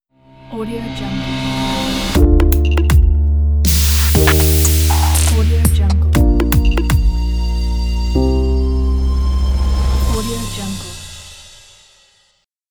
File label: logo digital